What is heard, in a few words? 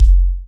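bass drum, Roland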